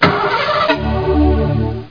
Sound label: Ship startup; Bedlam 2